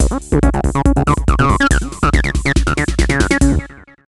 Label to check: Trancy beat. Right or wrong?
Right